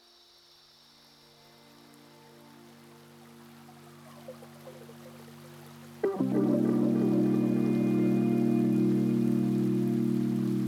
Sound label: lofi hip hop